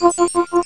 roll; ECTS 1998 Ubi Soft Entertainment

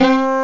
sitar

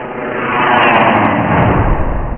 spinner; roadwar

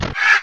harpy hit; Hellboy

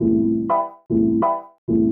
synth house 125 A; eJay; Keys

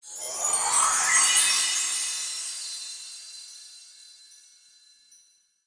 device disconnect

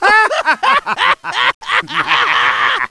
Laser Lab Sound

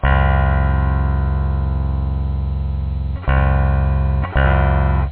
melodic

